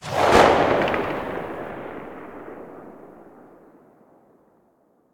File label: mosin muzzle mono far, weapons